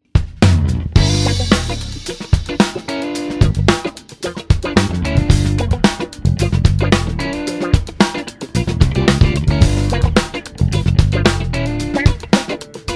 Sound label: pulse